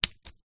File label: industrial metal crocodile clip press; zapsplat